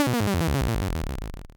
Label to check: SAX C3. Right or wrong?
Wrong